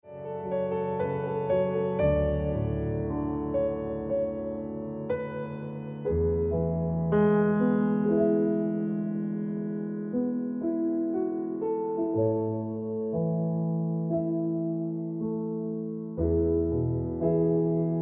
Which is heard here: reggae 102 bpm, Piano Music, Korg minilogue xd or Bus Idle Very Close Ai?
Piano Music